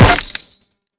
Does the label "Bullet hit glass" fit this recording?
Yes